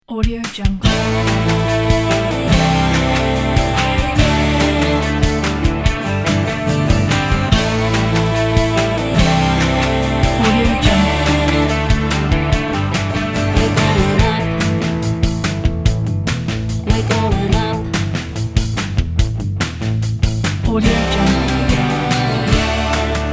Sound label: the upbeat motivation song